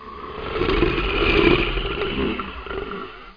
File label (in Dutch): grom; Spookslot Tunes Effecten